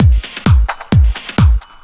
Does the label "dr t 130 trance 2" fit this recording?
Yes